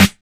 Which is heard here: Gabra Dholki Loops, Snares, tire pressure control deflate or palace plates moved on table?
Snares